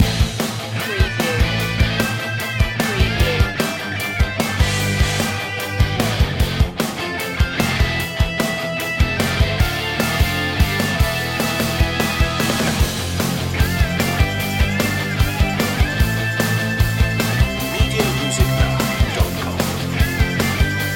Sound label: japanese cartoon theme loop